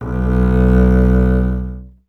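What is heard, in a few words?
STR CBS, Solo Instruments, double bass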